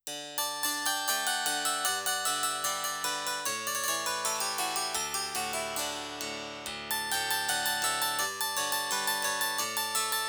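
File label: harpsichord; px s1100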